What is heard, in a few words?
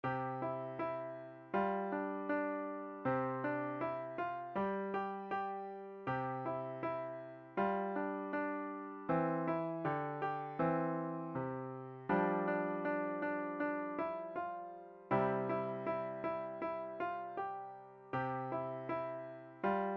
Harmonic Intervals, beginner piano music; Lightly Row